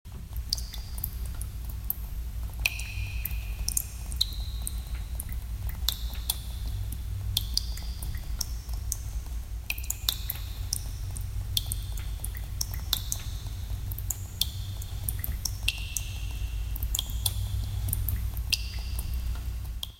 dripping water in cave; solarmusic

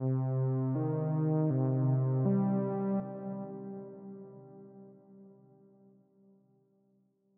bass arpeggio; arpèges; seeding-planets

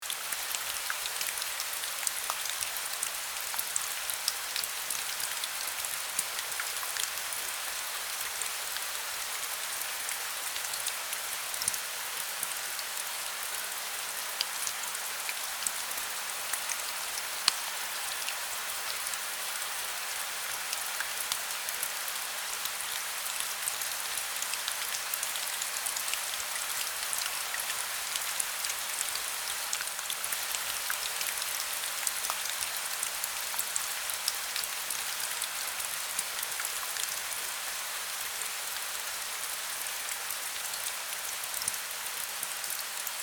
gentle rain; dragon-studio

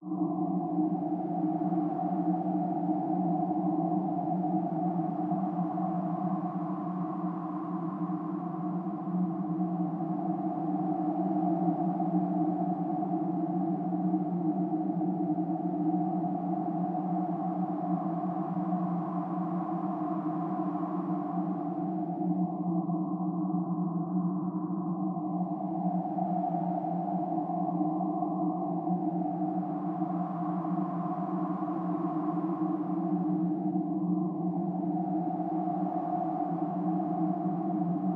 ambiance foggy

